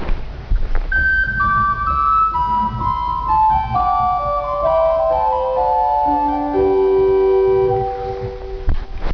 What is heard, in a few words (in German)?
Nassat; Vitus